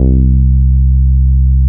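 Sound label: NORD MP C3, bass; NORDY BS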